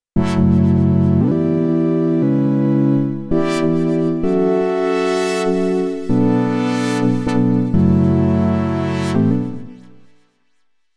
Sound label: KOPRO+ Tek Chord